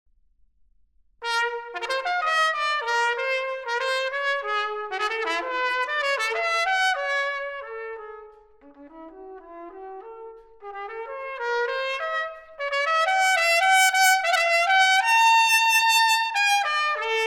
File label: Sonata For Trumpet, Unaccompanied; solo